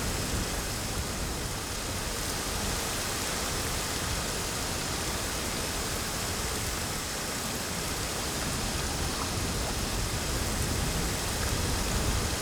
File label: ambience field night windy rainy; Rust